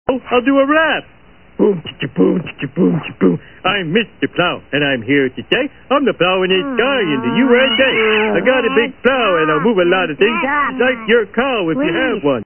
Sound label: rap